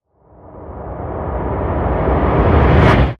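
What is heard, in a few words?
Whoosh Extinguished Reverse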